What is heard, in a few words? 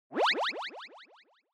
Anime Motion Delay